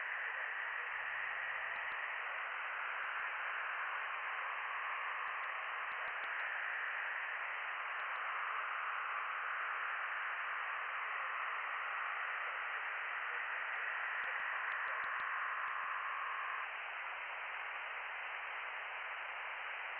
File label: from radio, 7177KHz